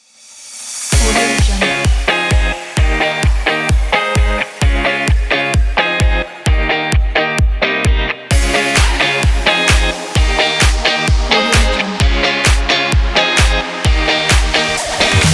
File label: summer pop